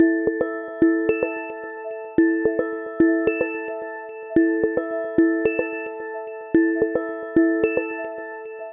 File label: Alarm